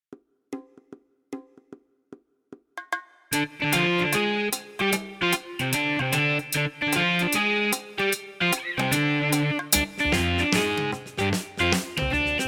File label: Rock; Rock Sentiments Vol.3